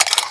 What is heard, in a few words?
m1911 reload clip out; svencoop; ww2projekt